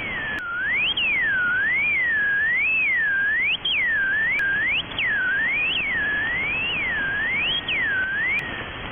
Mysterious oscillation from the Caribbean area on 28002 kHz